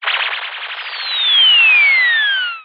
science fiction radio static interference descending signal